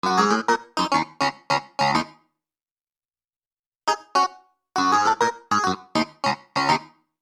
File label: Keyboard, Soul; Sonic Foundry (Sony Creative Software); Infinite Soul VOL-1